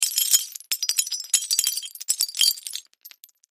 Wood Tile Sml Squares, debris

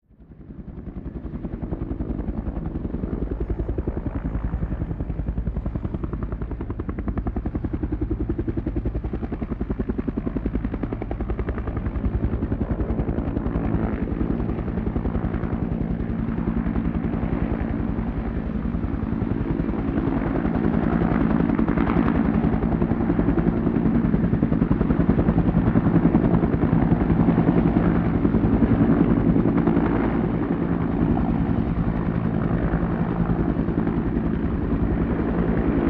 UH1 Huey Hover Medium

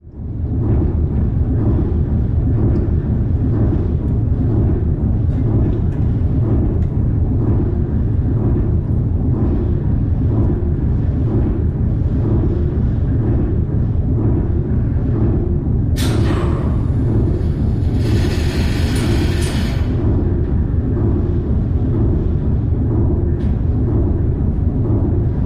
WWII Liberty steamship shaft rotates.; Boat, Steamship Engine, Steamship

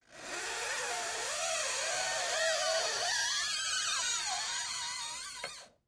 Rubber Squeak Slow, rubber friction

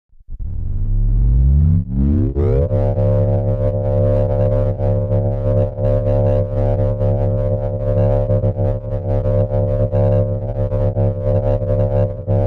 engine rev up down; engines

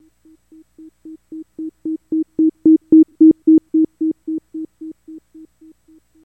Scanner Beeps Sonar; scanners

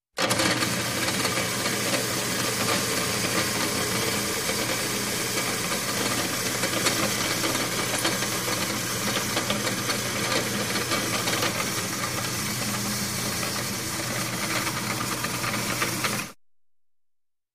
Motor Drill Press

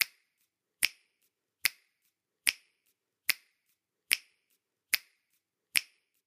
Fingers Beat Time Dou, percussion finger snaps

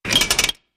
Metallic Machine, mechanical

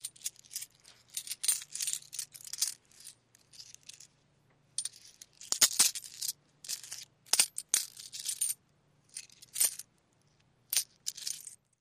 Coin Movement Jingli, coins

